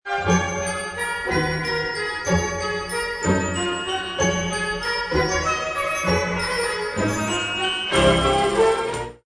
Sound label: jupiter, mallets